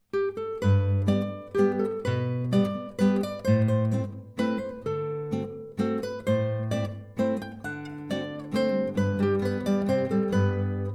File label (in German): Landler